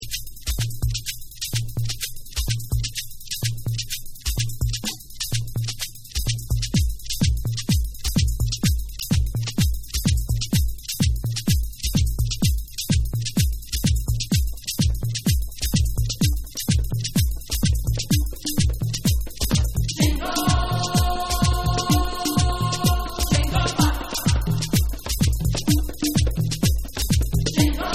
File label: Bonus Beats